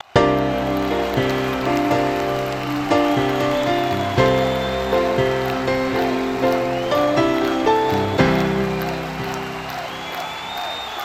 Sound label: Piano